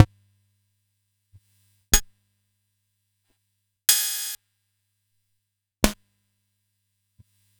Yamaha PSS-130

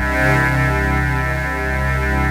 TUBE ATTACK, TECHNO; Pro-Rec - Synthsonic; Z1 TECHNO

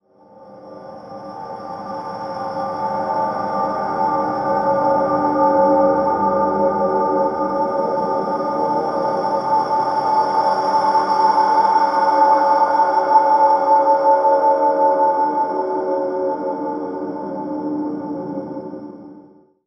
Ether, Atmospheres; Zero-G Ambiosis

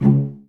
CL PIZZ C1; Best Service; Peter Siedlaczek's Advanced Orchestra

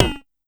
Pollard Perc, Syndrum

